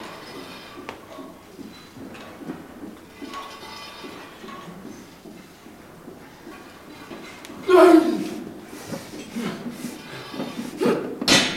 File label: Weight Room Amb Grunt, gyms